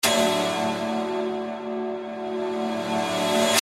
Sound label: Guitar Chord Strum, Guitar Chord Strum Reverse Delay Perspective Swirl; Guitar Chord Strum Reverse Delay Perspective Swirl Snap Back To Reality; Blastwave